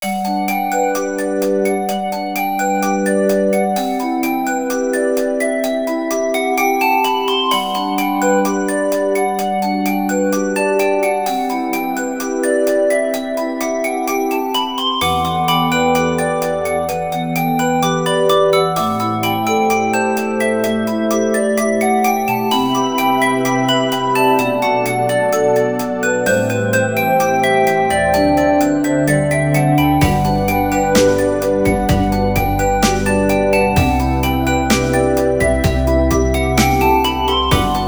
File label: Alarm; TyraFeel Alarm; Sony Ericsson; PlusFourSix; Tyra (G900)